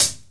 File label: Royality free foot hi hat sound tuned to the G note; subtle closed high hat sample g key